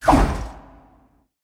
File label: Swing; Smite; god Kumbhakarna